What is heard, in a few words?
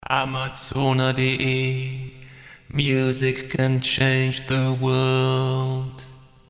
tc helicon perform ve sampler